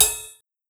Cymbal Short; Mistorcaveman; pitchglitch

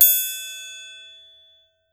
Perc Triangle Long; Bruno Bosselli

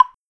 Perc Clave

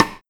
Rim Rimshot; Sajmund

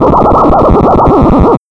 Sound FX Glitchy E Scratch

